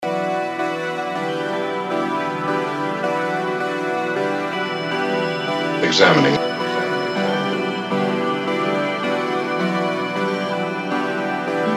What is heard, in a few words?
b minor 160